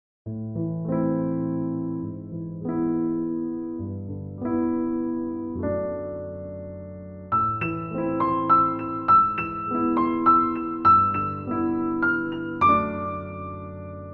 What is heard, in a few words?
A painful past, bgm